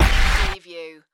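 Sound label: Production Element Hit Distort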